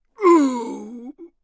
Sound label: death; Escape from Tarkov